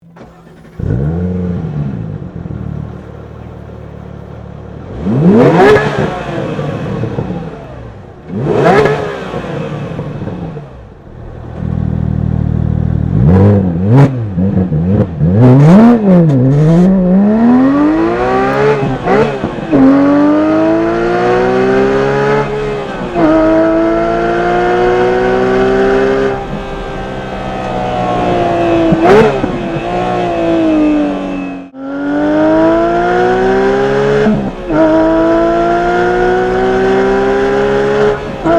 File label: Porsche 911 GT3 RS